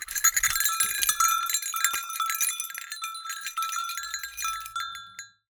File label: Cowbell Chain, Percussion